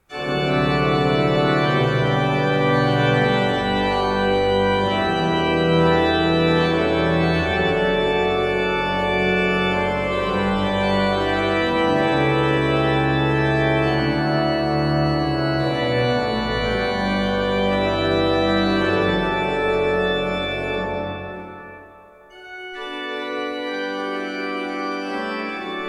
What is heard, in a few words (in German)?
Hammer 22 II P, orgel